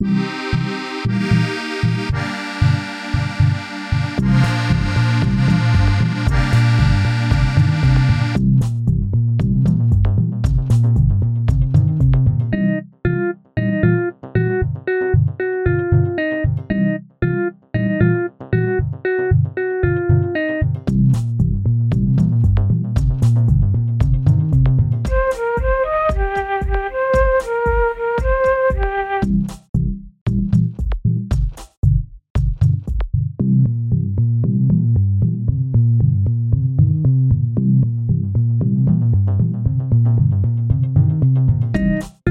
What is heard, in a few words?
Damped Loops